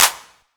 clap sample b key; percussion single hits one shots

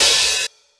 verby open hi hat one shot a sharp key

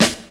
raw steel snare drum a sharp key; snare drum samples

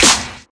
steel snare drum b key

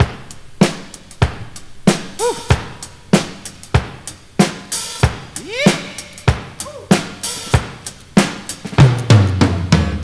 95 bpm breakbeat A sharp key; Samplerate 44100 Hz; drum loops

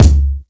treble rich steel kick drum c sharp key

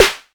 short snare drum sound g key; snare drum samples

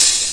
muffled open hat sound a key

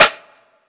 prominent acoustic snare sound e key

